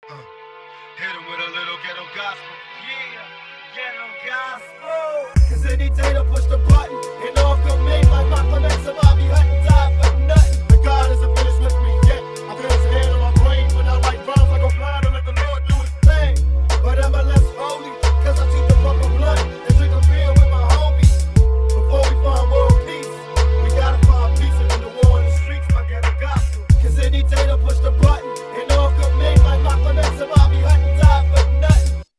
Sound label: Beat